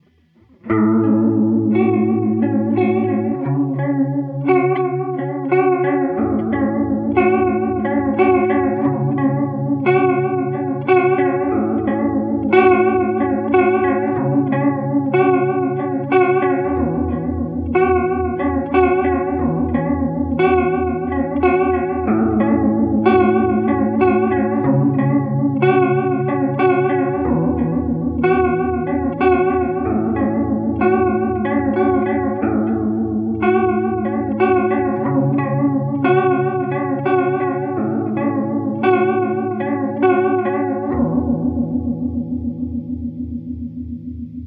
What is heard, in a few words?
clean + Flanger line